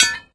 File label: defeat metal drop; smite; vulcan